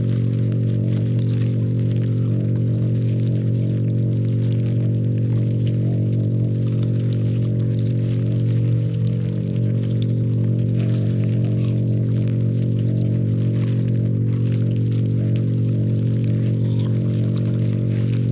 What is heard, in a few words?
ant ship construction idle; biogenesis